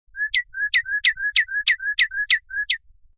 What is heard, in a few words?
Bird Chirp Alone, Single bird chirps several times; Birds; Animals